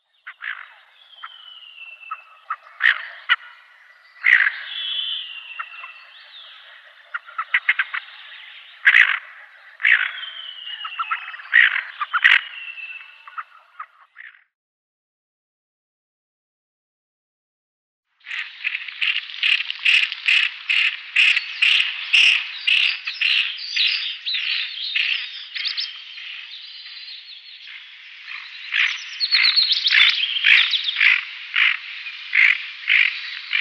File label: Tufted Duck females